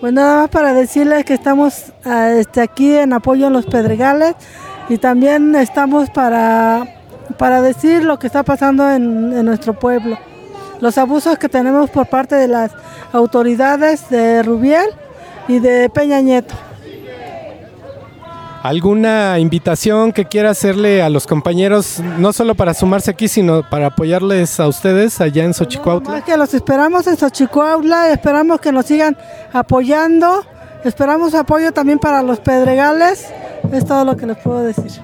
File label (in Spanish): marcha; lucha; Pedregales; compa xochicuautla